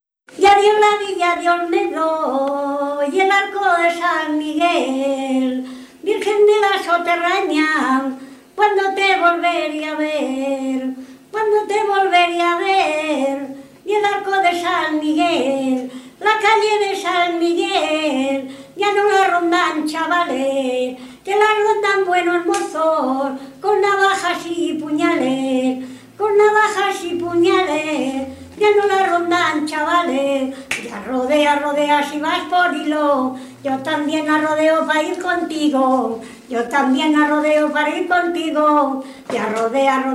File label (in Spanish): Jotas de Olmedo